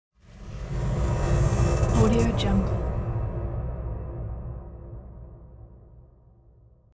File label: trailer transition; kipaloops